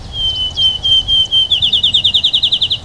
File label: sparrow